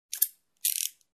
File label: Handcuffs clicking and fixed